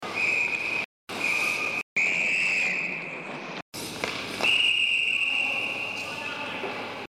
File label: hockey referee whistle blows